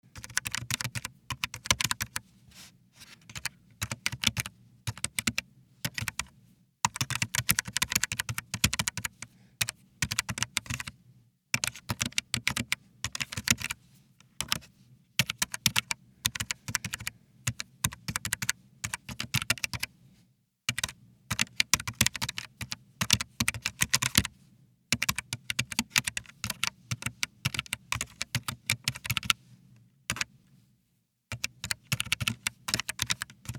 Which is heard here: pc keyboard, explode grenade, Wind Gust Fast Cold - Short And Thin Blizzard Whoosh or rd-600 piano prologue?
pc keyboard